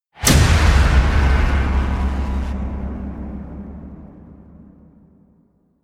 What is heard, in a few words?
destruction slams; weaponized